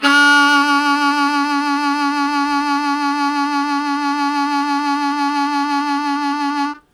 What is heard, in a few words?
Strong Vib Sustain D1, Harmonica; Zero G